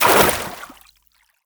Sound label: water sprint